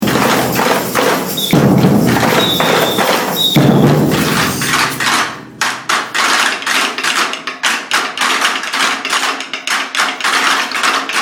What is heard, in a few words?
Swing Do Pelo Break 3 Caixas